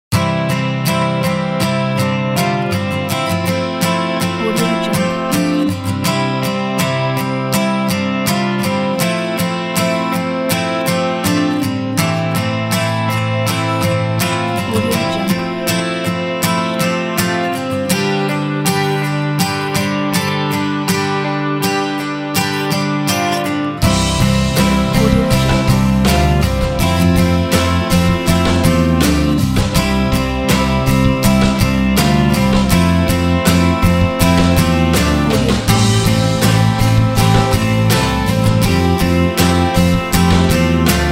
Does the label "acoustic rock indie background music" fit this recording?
Yes